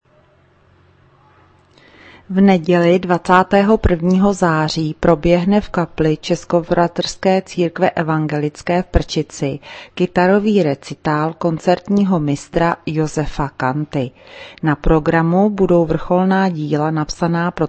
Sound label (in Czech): kytarovy recital